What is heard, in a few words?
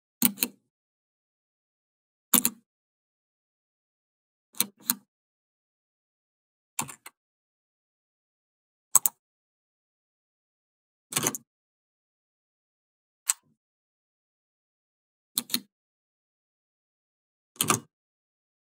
button sound effects